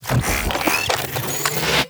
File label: Biped Stomp Charge; Warframe; Corpus; Ostrich; Shockwave